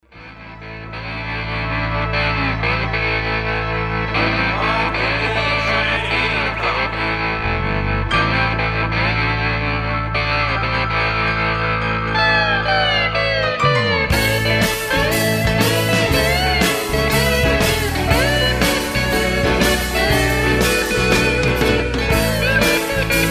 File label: solo